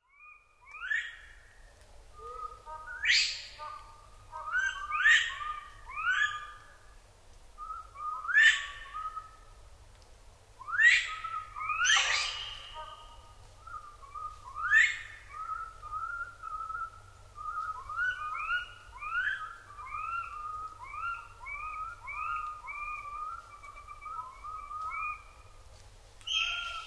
nihonzaru (child)

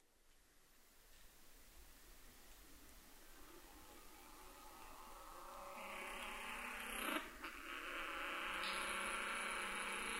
musasabi

